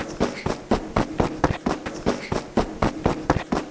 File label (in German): Auf Kissen kratzen